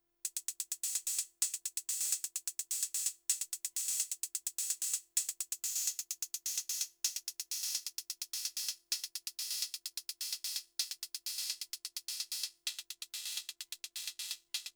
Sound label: RD6 Hi Hats